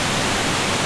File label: Waterfall Loop; Playable Tutorial Level